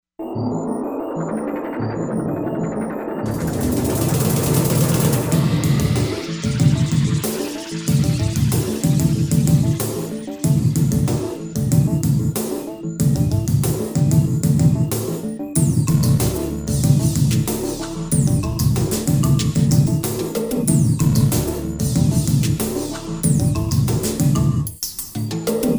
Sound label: korg m1r synth